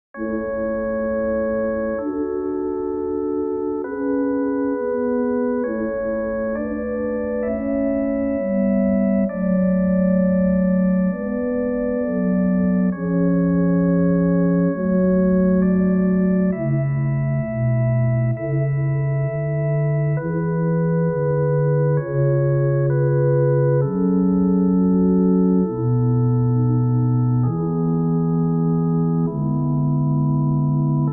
Music for Funeral Home, Ambient

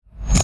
Tech, Weird Side Samples

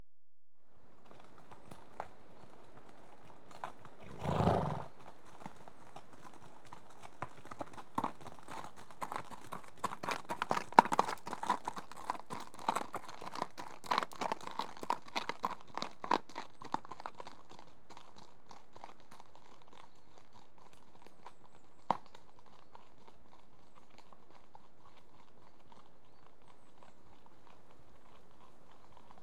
Two horses walk past on gravelly; BBC Sound Effects Library

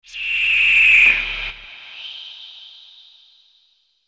Aliens Monsters; FXHome

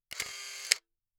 Photocamera; CB Sounddesign; Infographic SFX

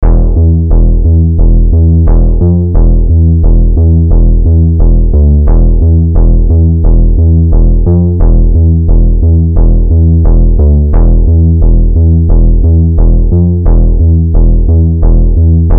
Thinking Tension, 70s Sci Fi

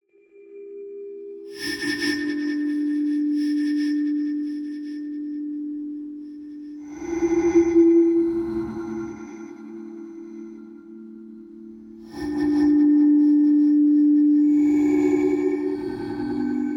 Kalimba Atmosphere; MatiasMacSD; SURREAL

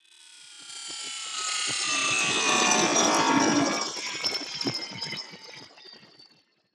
Movement; 99Sounds; InterSpace

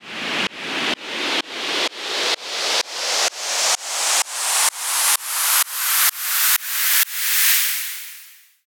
Short Sweep, White Noise

